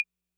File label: Clava Accent, 808